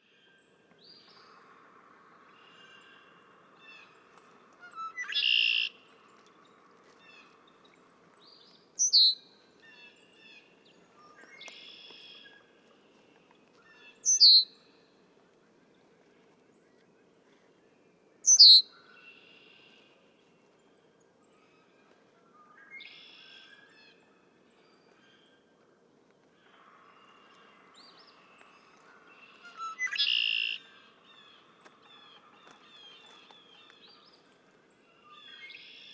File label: Red-winged Blackbird